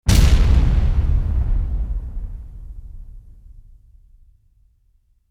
Crash Metal

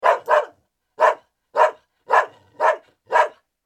Dog Bark Medium

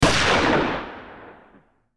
Gun Hand Shot

